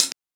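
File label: closed hi-hat, disco; Best Service ProSamples vol.48 - Disco Fever; AKAI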